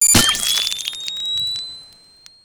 medkit infect; weapons

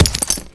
Napalm bounce, grenades

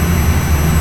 railgun chargeloop; weapons

